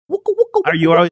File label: imitating DJ vinyl scratching